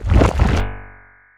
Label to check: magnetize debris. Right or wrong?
Right